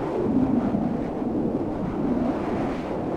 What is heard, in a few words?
wind; Monster girl quest Part1,2,3; Visual Novels